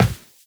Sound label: Run Default; Footsteps; Warframe; Characters; Tenno